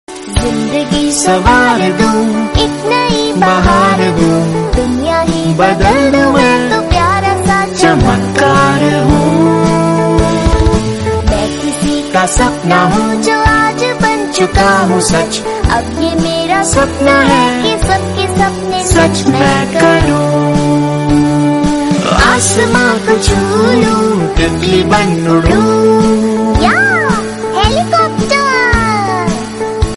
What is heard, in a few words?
theme kids cartoon song; Doraemon